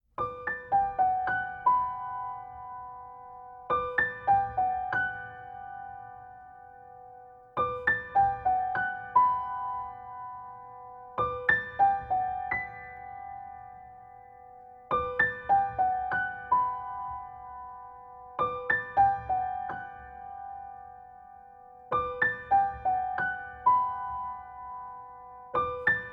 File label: Blues